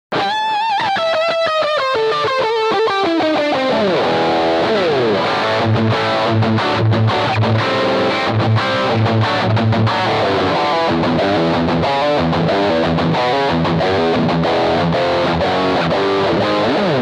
Randall MTS SL+Metal

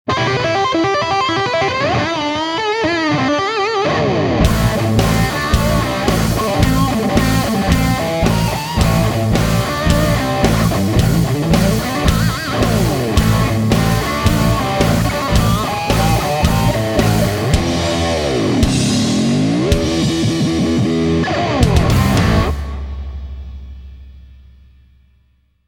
Brown Sound Eminence Private Jack

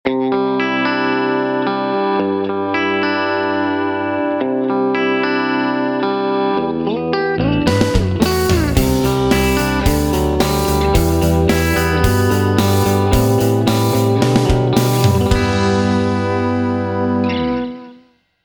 Irish Chime, Electro-Voice EVM12L Black Label

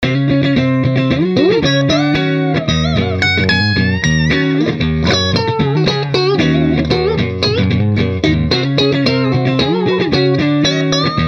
Fuchs Clean Machine Blues Machine

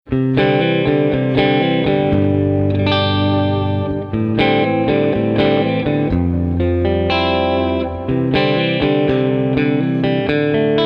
Gretsch Power Jet Red Witch Fender Pro Reverb

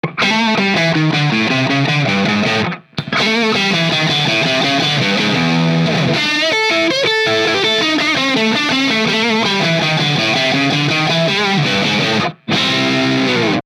Majik Box Rocket Fuel Boosted Tele; Majik Box DA

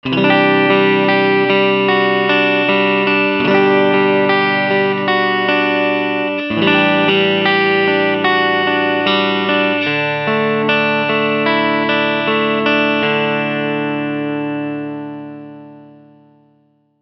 Fuchs Tripledrive Supreme Supremely Clean

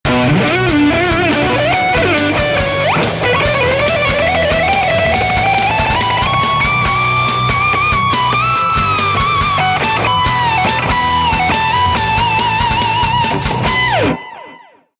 Ibanez JEM7V Metal Amps Solo; Toontrack Metal Amps EZmix Pack

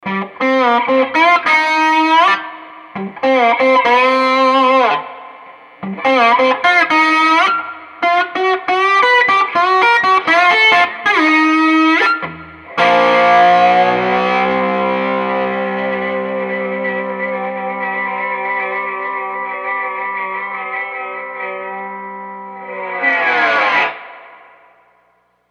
Fender Hot Rod Deluxe Slide Out

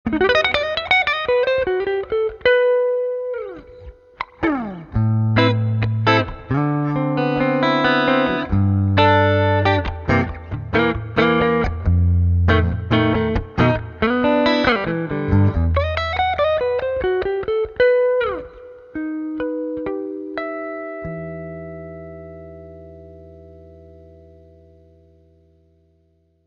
Gibson ES-335 Neck Roland JC-120

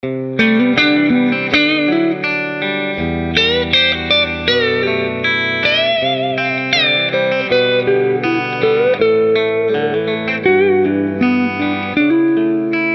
Mesa Boogie Lonestar

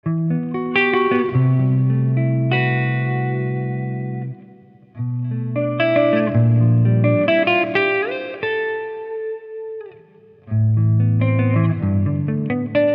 Fryette Pittbull UL Clean Channel Chordal

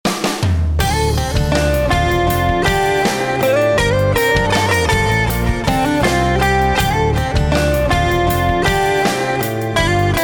Signature Series 12 String 11 50; Dean Markley

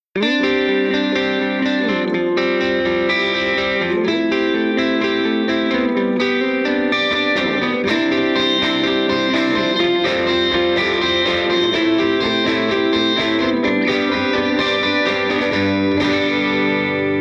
Ibanez AFS75T Keeley AD9 Vox AC30HH2

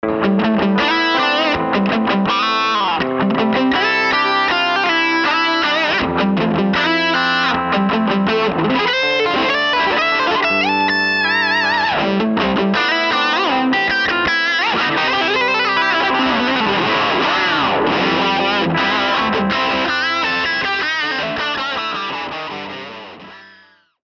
Fuchs Tripledrive Supreme

